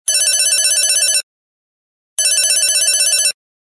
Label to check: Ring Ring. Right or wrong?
Right